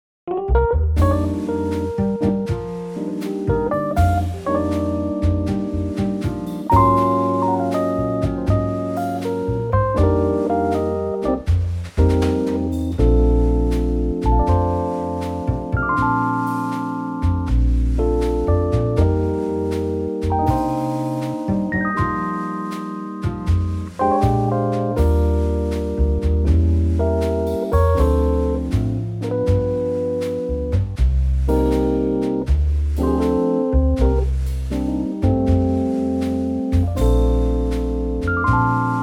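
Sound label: rhodes trio Bb, Backing Tracks for Male Vocals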